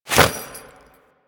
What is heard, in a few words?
Jump, Foley; Warframe; Grineer Royal Jester